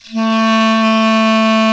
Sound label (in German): KLARINET B 1, CLARINET